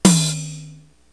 drum, EMU Proteus; Proteus1

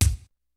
kicks snare, kid; Kid Nepro; Elite; KICKS SNARES

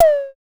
DSM2 Tom; MPC DSM-2

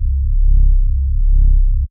C Bass

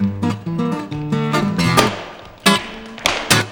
STL STRN BRK; Best Service; World Colours

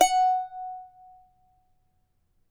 charango F#4; Spectrasonics; Hans Zimmer Guitars VOL-2

